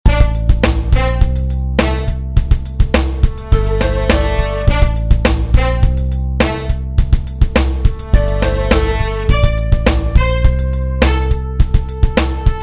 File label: instrumental